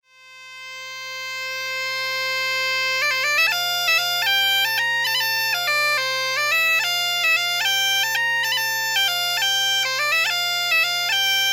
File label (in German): gaita melodie